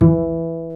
STR Cb Pizzicato; Roland L-CD702

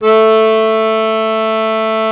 BANDONEON A1; Best Service; Alpine Volksmusik

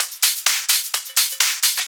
Hihat Loop 128 BPM; Best Service; House Definition VOL-1

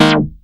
SHORT CHORD; Masterbits; Vintage Voltage; SEQ-FX

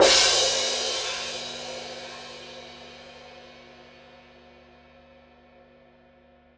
Cym f, Cymbals, Orchestra; 90 s Sample CDs; PowerFX - Downtown Orchestra

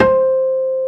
HARM C5, PF MIX; AKAI S-Series CD-ROM Sound Library VOL-2

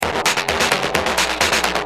Dist, Industrial, Drums Perc

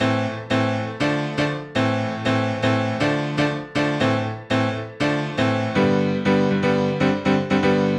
Chord Piano Am, Nu Disco, 120bpm; Zero-G; Extra Chord Progs

